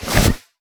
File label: hammer holster; melee; tfa starwars melee weapons pack